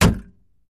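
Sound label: Metal Puncture Clunk, small impacts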